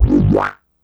Synth Hit G; Zero-G Trance Inducer; 90 s Sample CDs

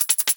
Top Fill Shuffle

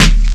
SNARE, Ragga & Dub Reggae

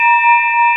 SINGING BOWL

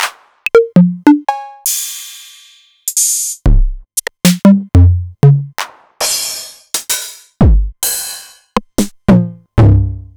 Novation Drumstation; DrumstationV1

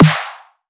snare, D; M8

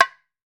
ROSEWOOD, African Drums

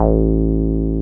303 SQR A2 MF, SQUARE BS

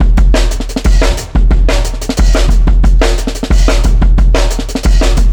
Marching Kit 4 Bar, Drum n Bass Beats; KJ Sawka; Drum n Bass & Beyond

